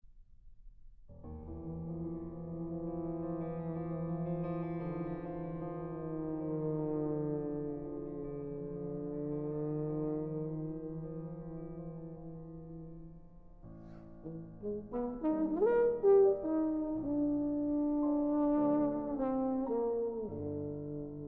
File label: Sonata for Trombone and Piano; 2. An American Ballad